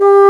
BASSOON G4, WIND; AKAI S-Series CD-ROM Sound Library VOL-2

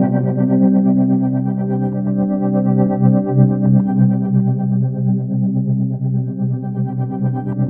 TREMOWASH, STRATICUS; Spectrasonic Distorted Reality 1

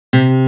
GUITAR 130 BPM delay; SONIC ELEMNT; SONIC EL YES

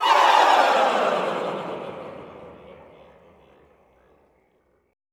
FALL, Choir; Best Service; Extended Classical Choir; FALLS

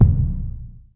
Bass Dr; Yamaha DTXpress; Percussion

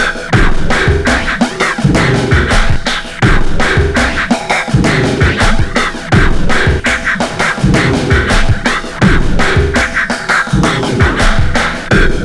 weird beat 83 bpm; Planet of the Breaks

